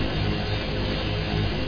apc fly; machines

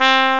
trumpet

